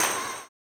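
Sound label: tambourine, 160; Spectrasonics; Vocal Planet; Blues Soul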